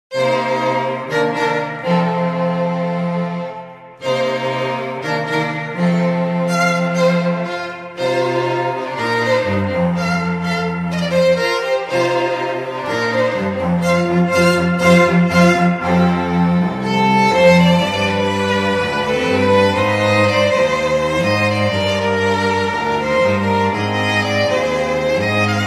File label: strings